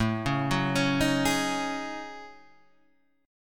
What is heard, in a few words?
F sharp Minor 6th Add 9th, Guitar